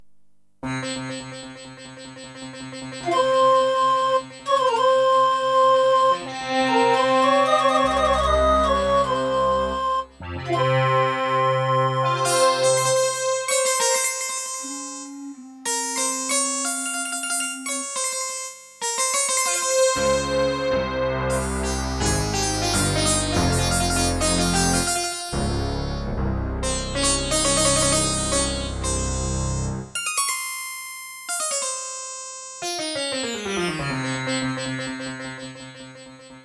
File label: Intro, Pine PT-202 Vivaldi Wavetable; Prince of Persia 2 - Intro